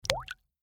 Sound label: water drop